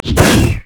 dragon basic attack, monsters; LoL

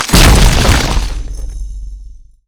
pain; LoL; trundle

